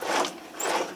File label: Plane P40 Lever Rudder Pedal; Airplanes